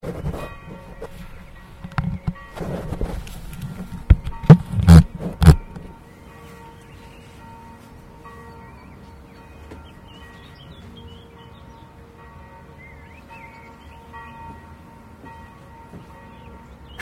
church bells; fieldrecs

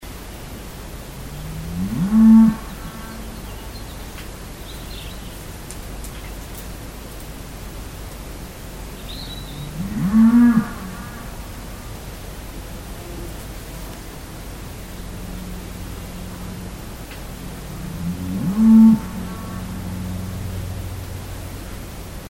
cow; fieldrecs

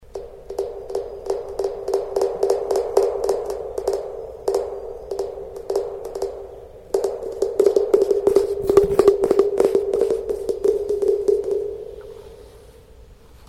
UFO; fieldrecs

